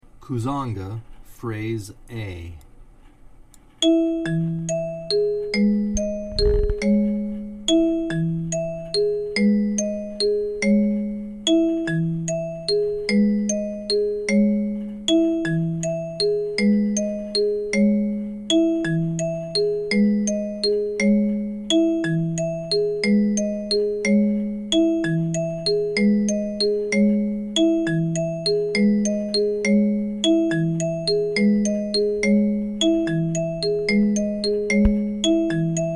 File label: Kuzanga Prog A, kalimba